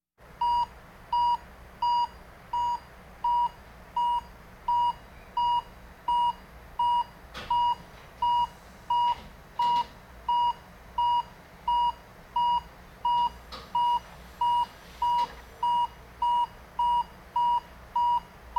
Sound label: Hospital effects